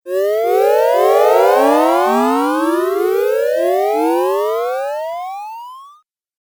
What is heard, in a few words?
Magic Fx Video Game; Twistedtracks